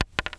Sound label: wood; Mafie 1